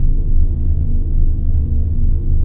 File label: tram, ambience; doomgiver